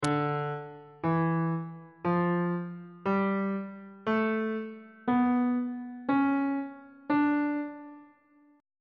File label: c double sharp melodic minor scale classical descending